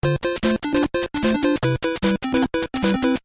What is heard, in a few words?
music starpower